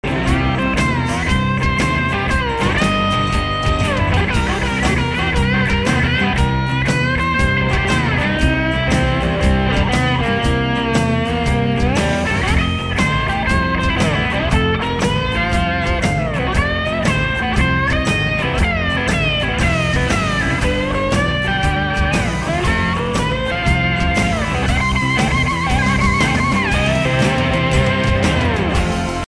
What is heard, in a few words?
slide solo